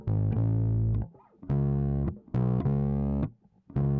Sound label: bass; musdb18